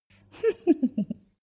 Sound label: female laugh quiet